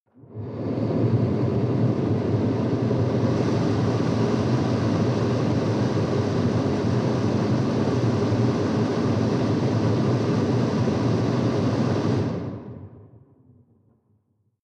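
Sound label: sports car interior ventilation hard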